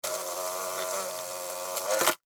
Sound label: Hornet, Land, Fly, Wings, Buzz, Insect, Bug